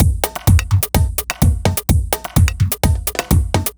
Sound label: Tribe A# 127bpm, House Drumloops; 90 s Sample CDs; Zero-G House Fabrik Drumloops; ZG Drumloops